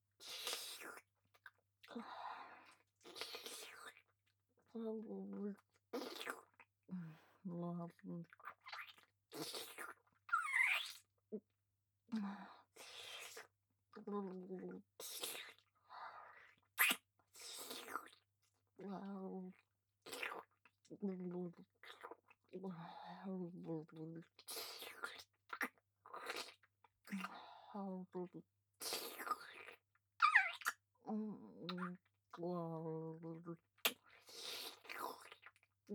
正面 普通 やや左下 舐め中心フェラ; ネモナ

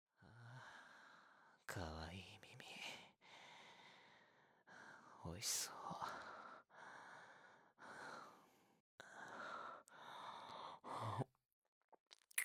耳舐め; 耳舐め4作品分集めました

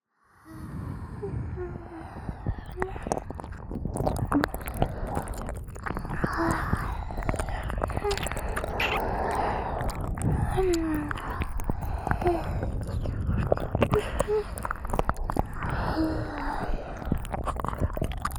両耳奥舐めループ, ASMR

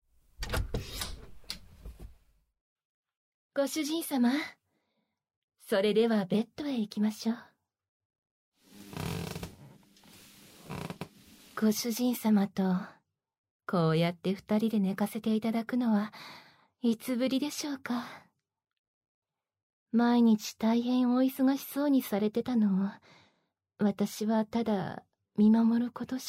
添い寝しながら耳舐め からの ご奉仕ベロチュー

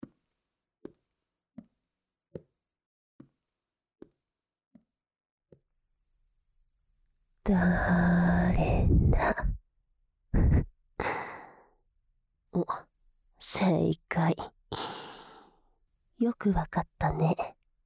両耳舐め; 監禁; ムラムラしてきちゃった…両耳舐めパンツ素股

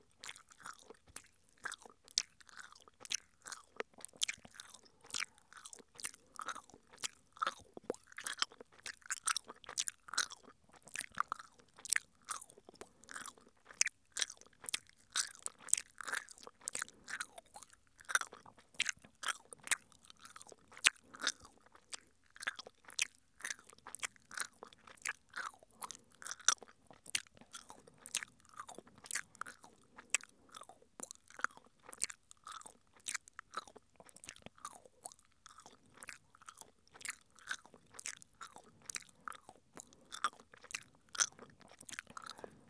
くちゃくちゃ音 右; ラバースーツ彼女

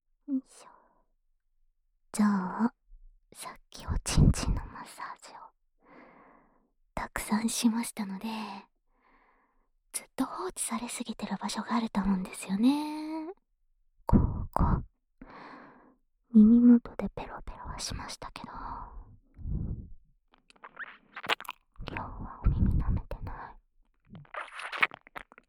舌でお耳マッサージ ピロートーク, ASMR

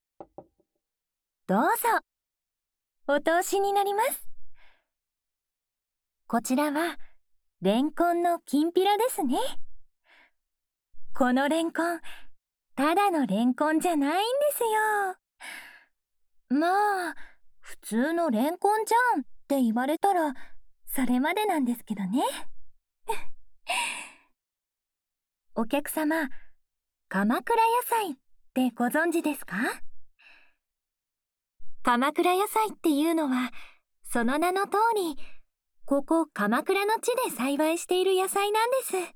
お通し咀嚼音・日本酒ASMR; 歯ごたえをお楽しみください♪; おしごとねいろ ～小料理屋編～; CV.安野希世乃

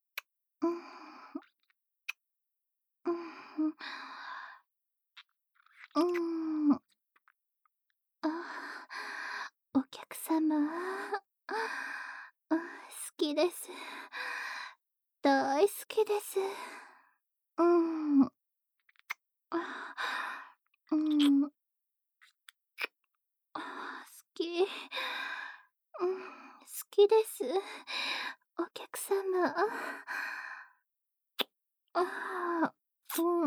お客様しゅきしゅきキスループ

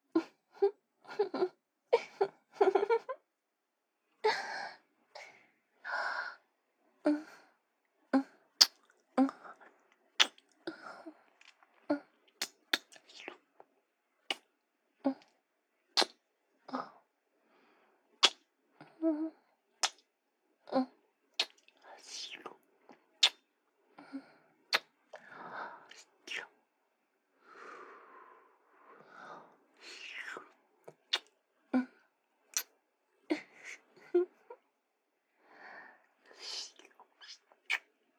キス; 【マゾハーレム】

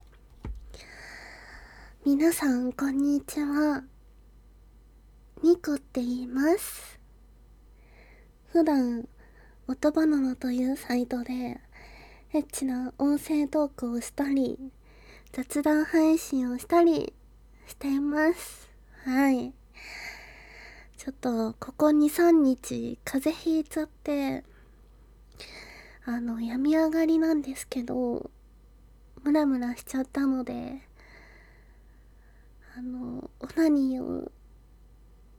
オナニー大好きお姉さんが吸引バイブでオホオホアヘアヘしながらイキまくる音声; 実演

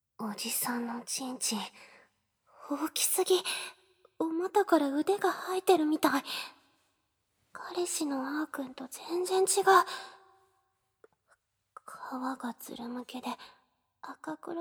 耳舐め 手コキ 耳元オナニー フェラ; 初デカちんぽに激惚れ耳舐め手コキからのご奉仕フェラ; KU100; 本番なしパパ活JKのハメ撮り日記

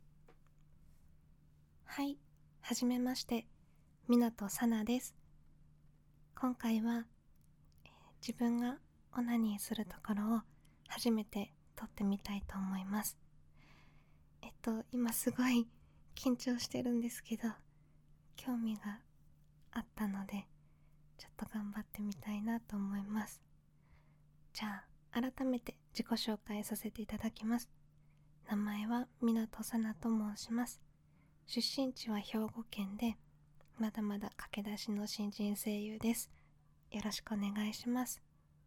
初 ガチ 実演 オナニー; THE FIRST ORGASM; おっとりとした性格だがオナニーが大好きすぎる新人声優のガチ実演

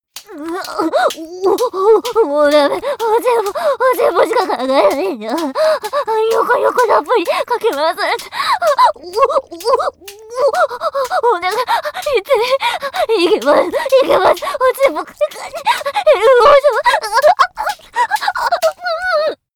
クライマックス(おほ声ハード); 清楚ビッチエルフ姫のメガ盛りマントロフルコース

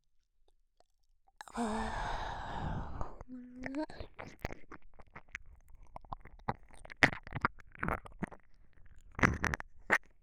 耳舐め 通常 右; ボーイッシュなラバースーツ彼女に赤ちゃんにされて再スタートする人生

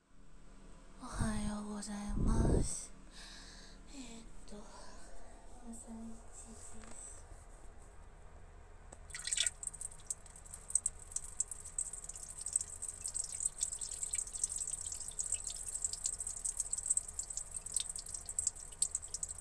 朝のトイレでオシッコ; ６日目・本日最初の

